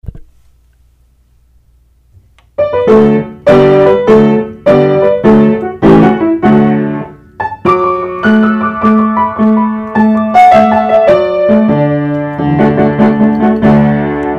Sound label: piano